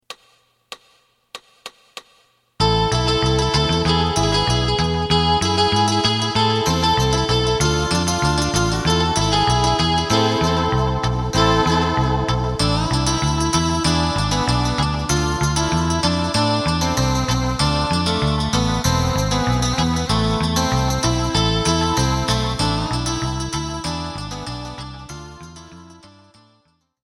live drum

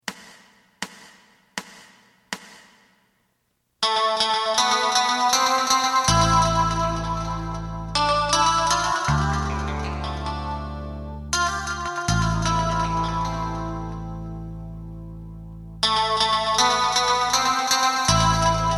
guitar drum

